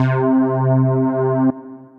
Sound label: Retro Keys; Synth Keys; Ridgeline Retro Keys; Ableton; Factory Packs; Singularities